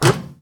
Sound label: Car Land Hit